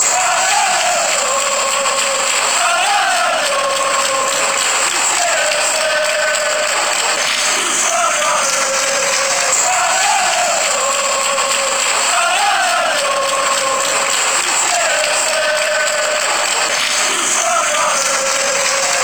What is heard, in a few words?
allez allez oh, fan chants